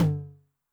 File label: Mid Tom Noise High, 909